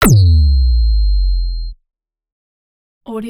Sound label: impact feedback kick; Robotic Lifeforms 2; soundmorph